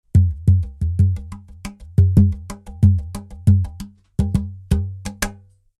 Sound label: slit cajon